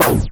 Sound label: Scratching FX